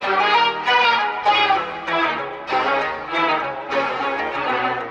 horns and strings, loops; Phonky

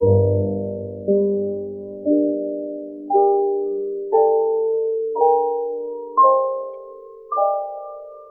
piano, Loops Sad

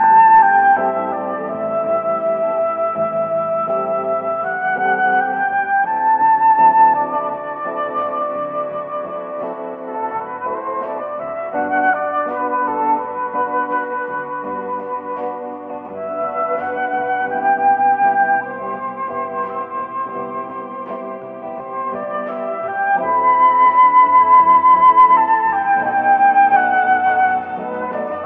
flute, Jazzmatazz loops

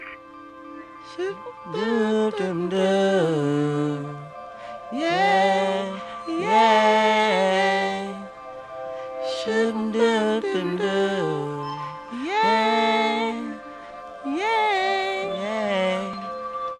voice; Phonky

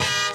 electronic

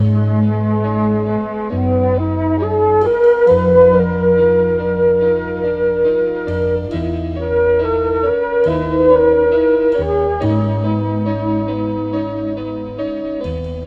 flute, Jazzmatazz loops

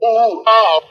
"ooh ahh" male

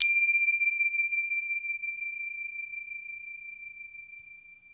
bring, Bells

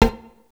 dish, Synths

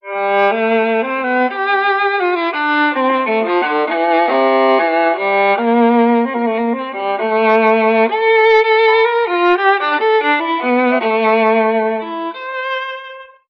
strings noodled cello, Sad; Loops

